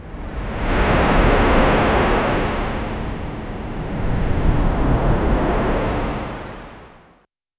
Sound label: ocean waves suddenly hit, ships